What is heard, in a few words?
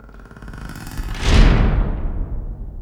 DOOR CLOS; SoundFactory; FX